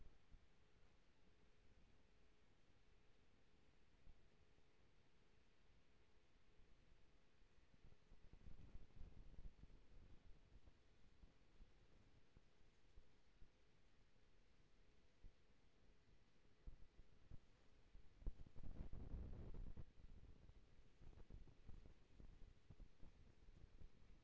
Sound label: HYDROPHONE 16000Hz; JASCO AMAR HYDROPHONE 740